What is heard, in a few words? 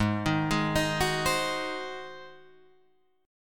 G sharp 7th G sharp, Guitar Drop D